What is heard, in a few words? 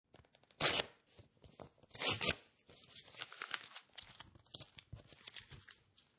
Rip Tear Envelope And Crunch, Rip paper, tear up paper envelope and then crunch paper in hand.; Ripping; Lynne Music